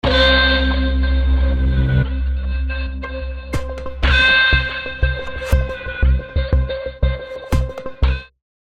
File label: Rhythmic Pedals TRP; Gravity 2 Menu XL